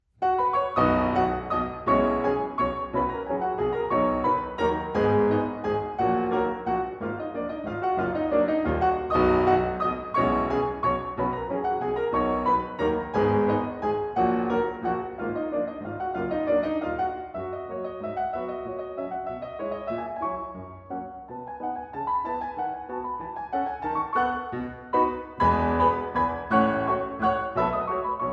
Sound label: Waltzes, For Piano, four Hands, B Major; Brahms